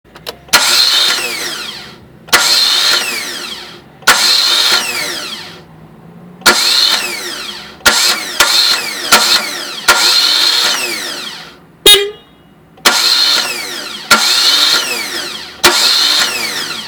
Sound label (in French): bruit demarreur